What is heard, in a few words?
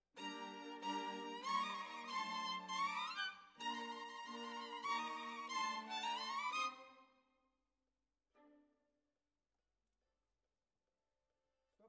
Violins, jazz for piano